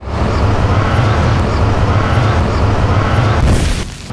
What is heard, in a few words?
spell power magic arrow cast